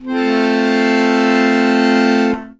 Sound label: AKORDE F DUR, ACCORD; ACCORD.CHRDS